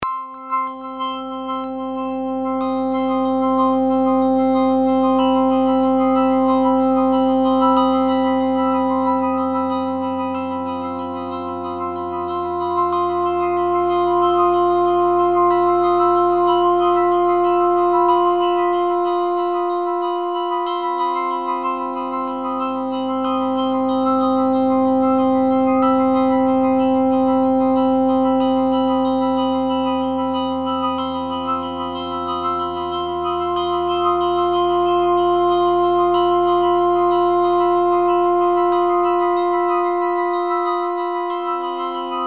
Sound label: Darkness No Bass No Drums; Chuck's Zombie Petting Zoo; Marr0w Productions